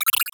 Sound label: micro high tech, high tech interface sounds; Lesser Vibes